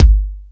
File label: Lotsa Kicks, Drum Machines E-MU Pro-Cussion